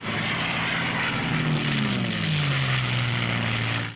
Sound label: engine